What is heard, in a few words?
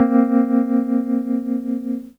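EP vib B2 left; E-MU Formula 4000 Series Vol. 1; Hip Hop Nation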